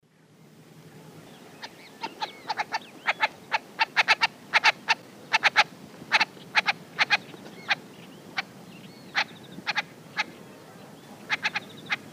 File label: Gallinago paraguaiae, flush calls; S. America; Eastern Lowlands